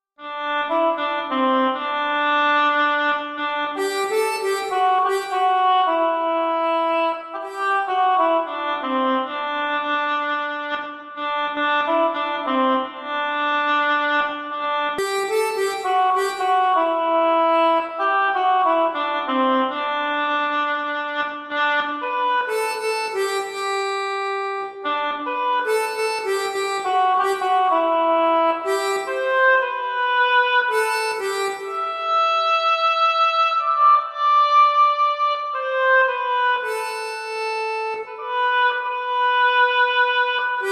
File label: Oboe